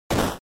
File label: Hit; NES Fighting